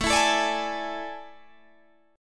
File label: A Major 7th Suspended 4th, Guitar, Open G